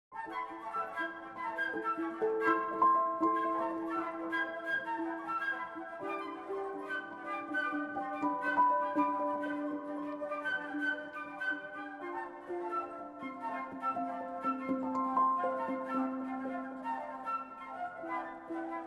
Short Experimental Trailer Piano Woodwinds; Music For Migrating Birds

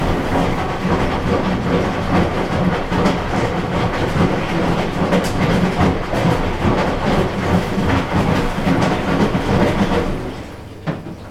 Washing Machine And A Boiler

